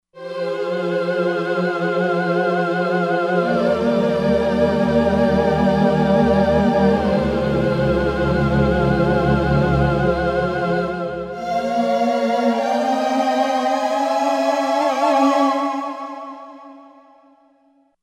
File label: Roland Fantom XR; Sad ceremony